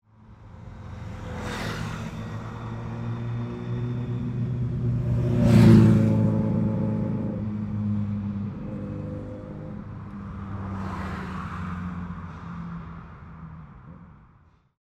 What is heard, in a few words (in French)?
moto